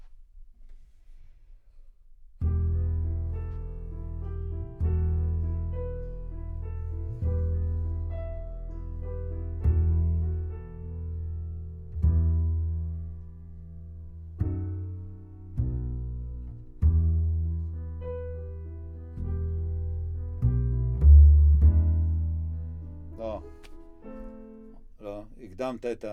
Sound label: C Bass Bot